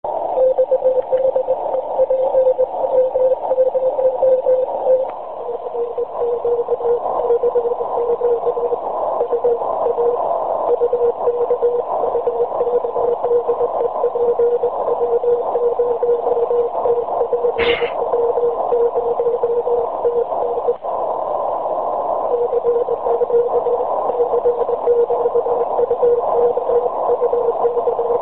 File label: cq uk cw